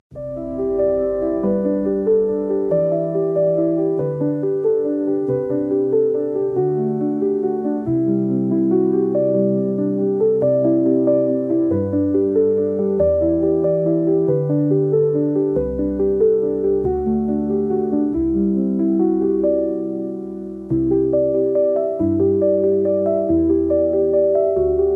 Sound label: silent piano